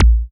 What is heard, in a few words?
Club Kick